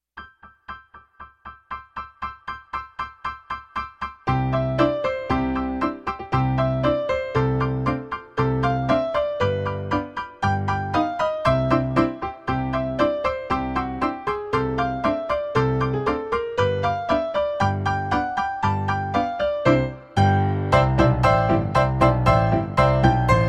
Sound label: jingle bells